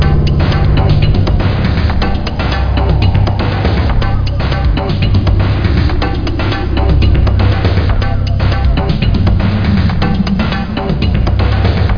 horror

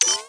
ping

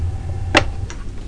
pick; MediaFactory Demo 1.0

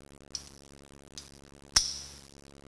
harness, fire

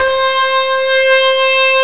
tube driver

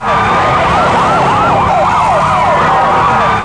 Sound siren; BlueGame

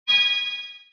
toy Collected; emu-mess

